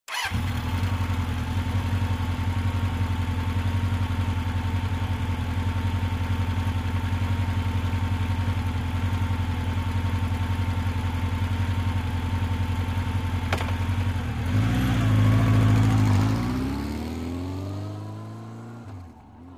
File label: Motorcycle starts, waits, drives away